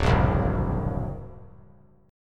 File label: D Augmented 7th D down; D+7 strummed; JGuitar